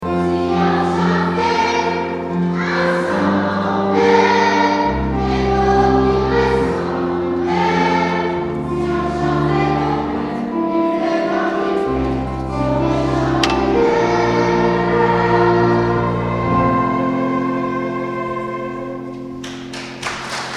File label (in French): chorale enfants